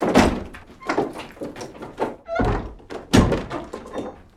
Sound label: Door Antique Open Close; Home & Office; 1001 Sound Effects